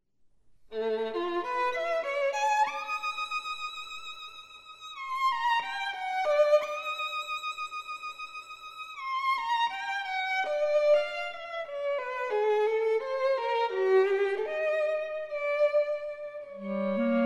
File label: duo violin clarinet